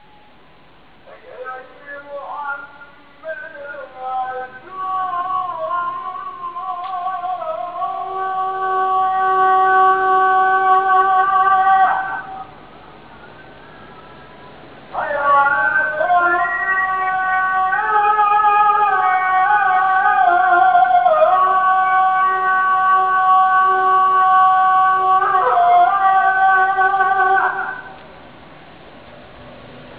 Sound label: muslim